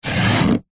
foley cardboard box flap move fast

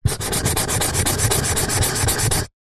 office marker pen scribble on empty cardboard box

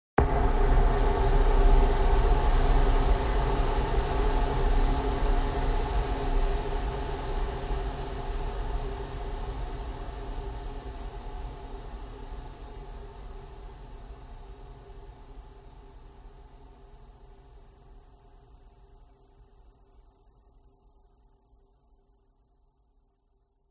horror hit dark deep lfe resonate fear

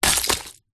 ice chunk small drop; nature

